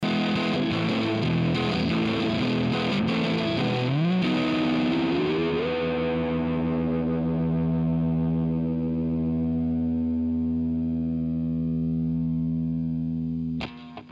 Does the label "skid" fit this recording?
No